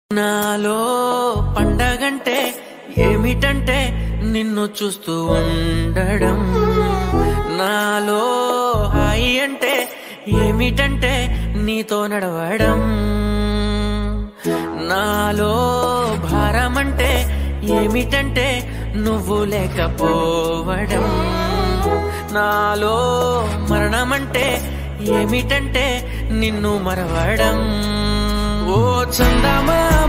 telugu breakup song bgm ringtone

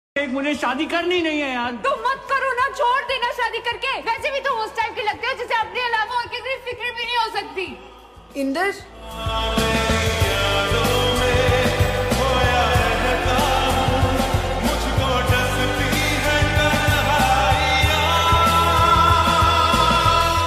sad status; tara vs bilal

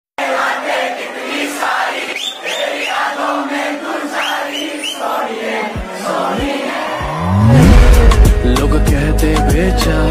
lofi song

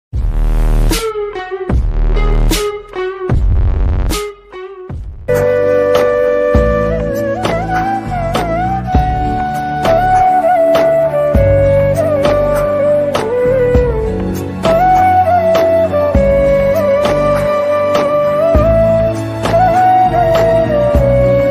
flute ringtone flute bgm ringtone sad ringtone

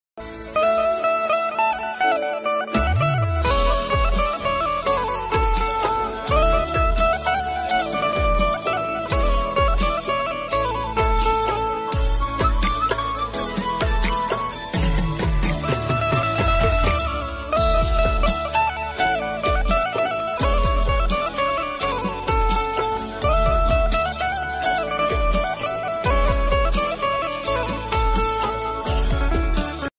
music ringtone gujarat ringtone; newringtone

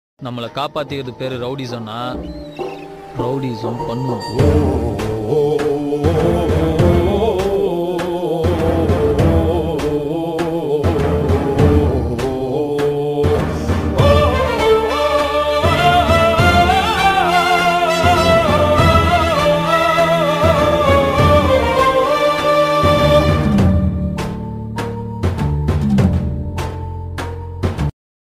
bgm mass; vadachennai

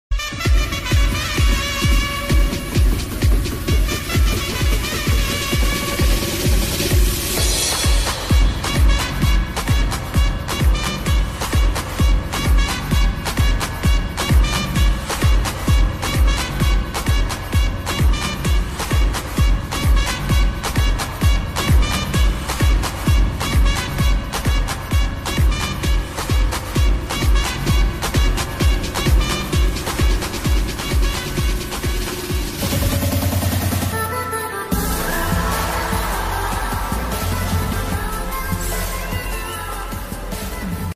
ipl background music; ipl ringtones; ncs

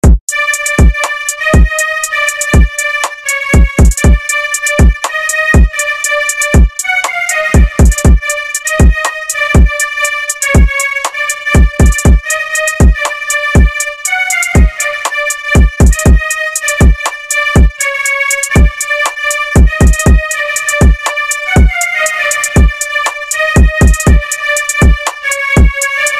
interval bgm ringtone; thalapathy vijay; venkat prabhu

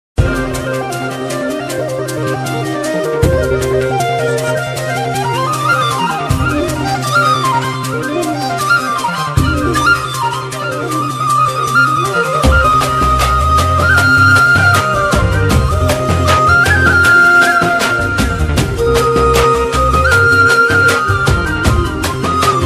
trending ringtone tamil song love bgm

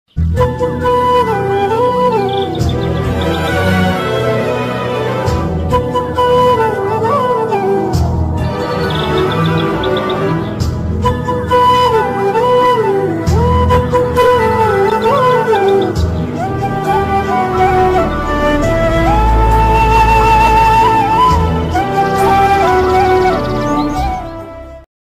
flute ringtone mobile ringtone

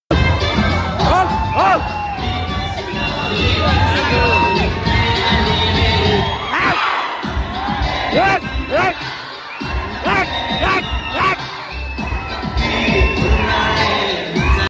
song theatre response; kushi rerelease

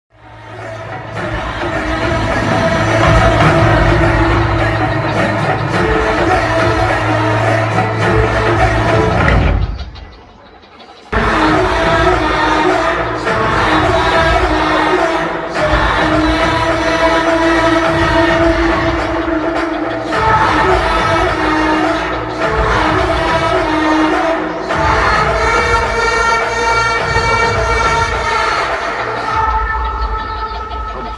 aranmanai 4 horror bgm; tamannaah; raashii khanna; sundar

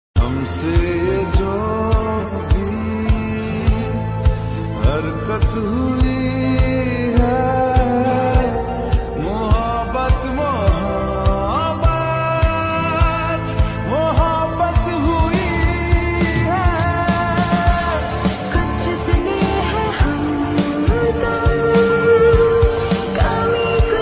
love status lofi slowed

